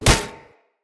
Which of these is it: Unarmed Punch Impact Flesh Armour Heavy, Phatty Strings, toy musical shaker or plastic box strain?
Unarmed Punch Impact Flesh Armour Heavy